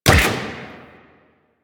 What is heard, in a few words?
Rifle Fire; Warframe; Venus; Venus Elite Spaceman Leader; Supra